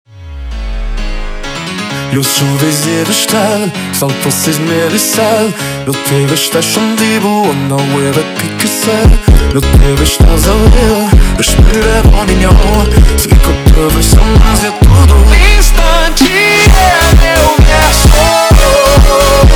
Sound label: Batida